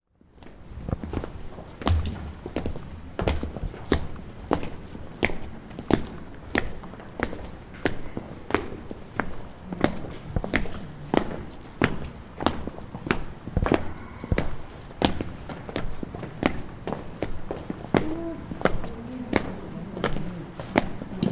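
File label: footsteps, civic hall slope; 市民館スロープ; 靴音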